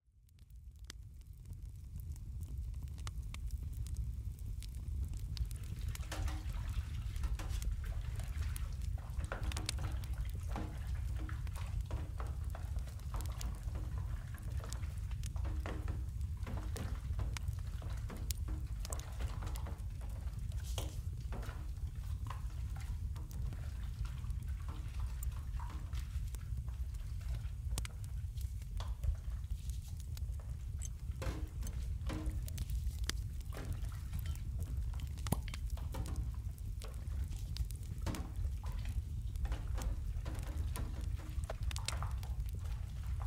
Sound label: wood cabin ambience